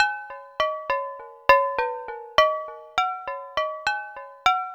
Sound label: Struck Glass Melody